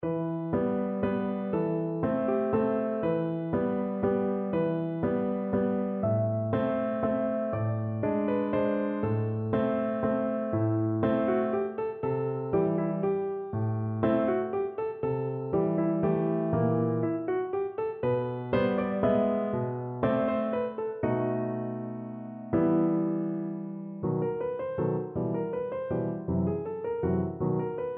waltz piano; grieg

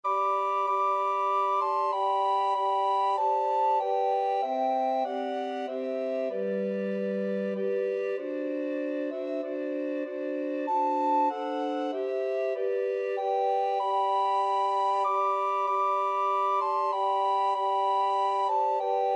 away in a manger, recorder quartet

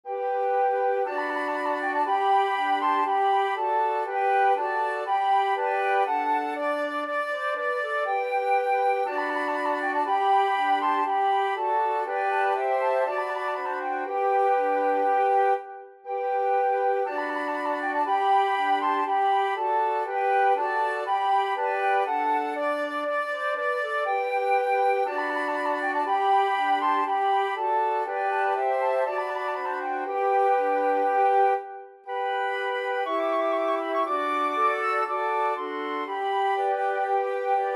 flute quartet

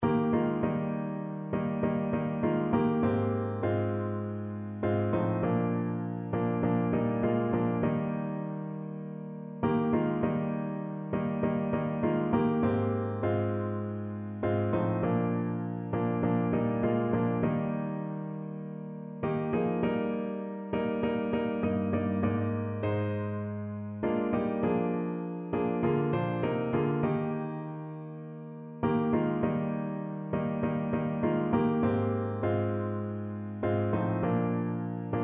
piano

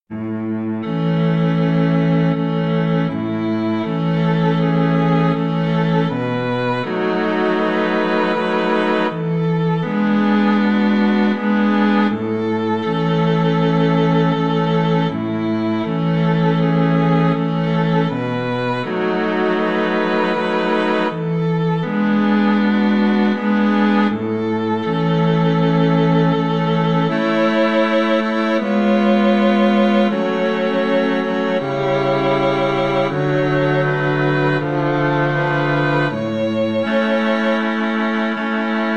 aura lea, string quartet

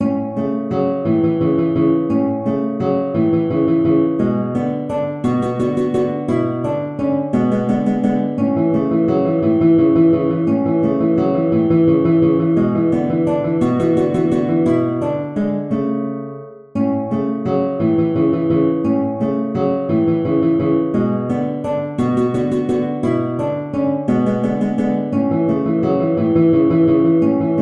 Waltz 2 GTR

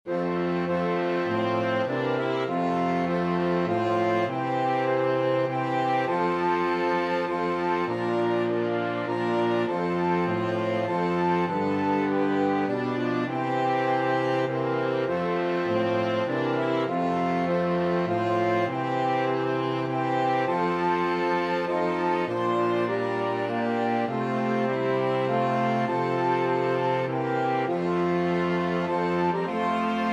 flexible mixed ensemble, 4 players

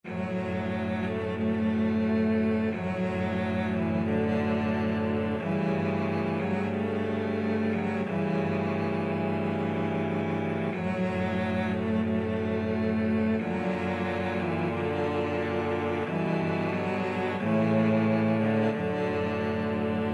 cello quartet

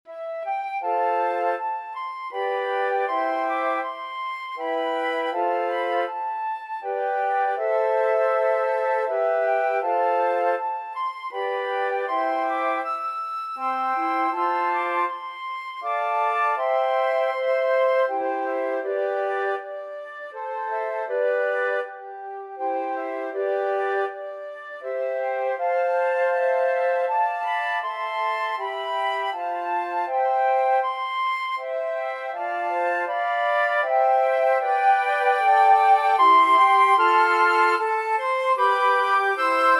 flute choir

